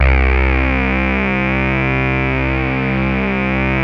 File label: SAW RAVE, LEADS